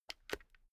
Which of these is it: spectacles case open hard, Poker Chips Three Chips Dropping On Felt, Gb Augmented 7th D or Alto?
spectacles case open hard